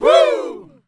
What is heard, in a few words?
Vocal Oneshots; Vengeance Samples; Vocals